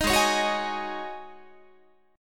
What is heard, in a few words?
Ab 7th Suspended 4th Ab down Guitar